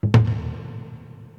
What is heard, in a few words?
mission drum